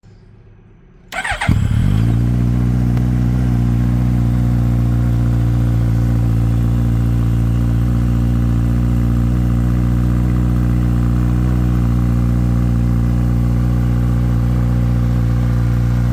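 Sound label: Sound GSXS1000, SUZUKI GSX-S1000 Auspuffsystem; 2021-2026; Original-Anlage 2.05 kg; SUZUKI GSX-S1000 /GT/F/Katana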